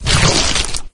carrier walk; flood

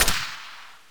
fire; decar

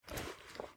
generic cloth movement, weapons handling

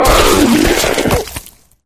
flesh destroyed large; flood

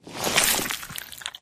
human form long move; flood

